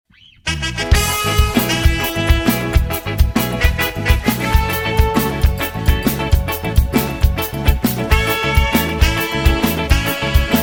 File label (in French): L'Ambiance Réyoné; PLC Muziks 974